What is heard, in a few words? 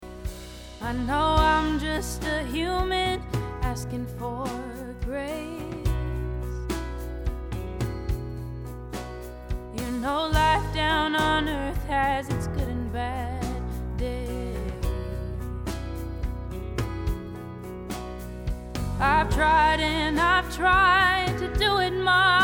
Track Slate C12, virtual mic